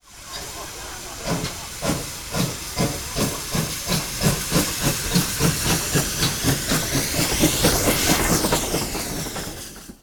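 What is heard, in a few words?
gwr pannier, train; konakaboom; simutrans; Pak128.Britain-Ex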